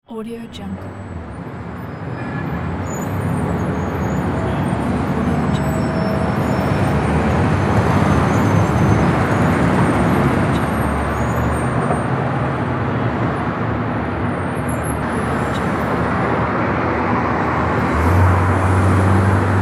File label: city ambience